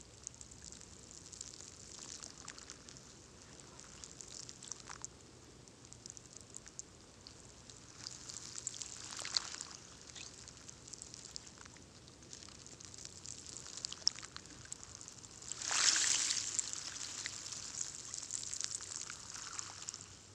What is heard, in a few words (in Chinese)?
水花慢; 海浪聲; 自然音療